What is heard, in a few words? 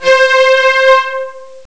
bow; ST-XX Amiga Soundtracker Sample Packs